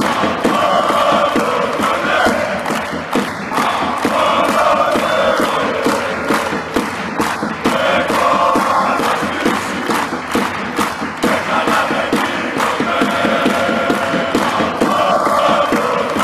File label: chant, fanchants; appolon